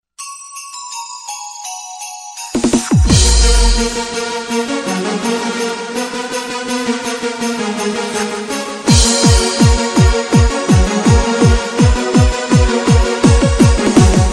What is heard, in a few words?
christmas ringtone